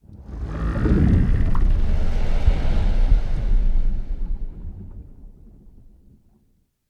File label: aquatics portrait machine